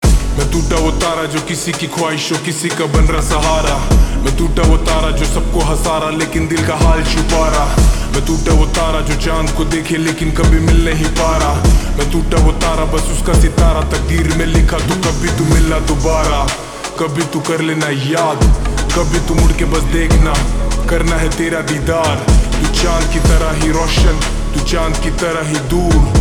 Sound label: Slow Reverb Version Ringtone